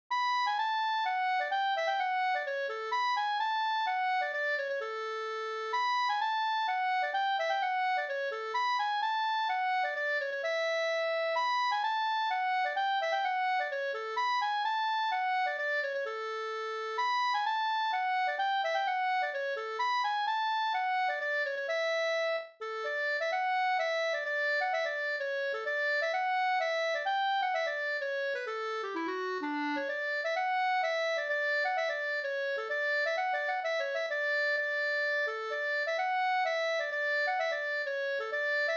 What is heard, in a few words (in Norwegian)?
pols; Sulhusgubbens drom no